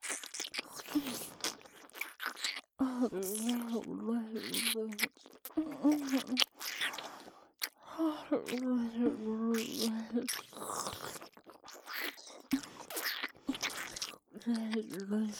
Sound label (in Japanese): 両耳舐め レロレロ＋キス; お姉さんの爆乳パイズリに溺れて抜け出せなくなるお話～おねショタから貢ぎマゾまで～; 妹すたー; オナサポ差分